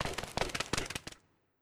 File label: Skell Dog Run; Legend of Keepers (gamerip) (2019)